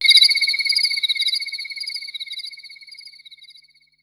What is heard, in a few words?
Crickets, Korg Wavestation-SR; Drum Kit